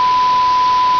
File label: single with noise